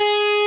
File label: Arp. x 4